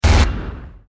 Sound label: Gatling Shot; android; gamerip; Blockman Go